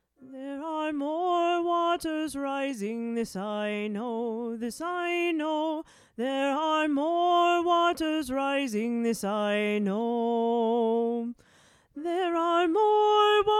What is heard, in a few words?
Alto